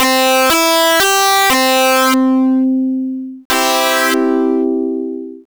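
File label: electric guitar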